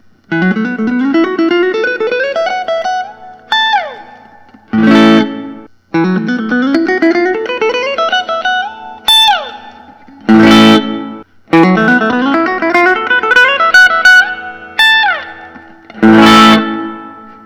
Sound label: fender usa artist series blonde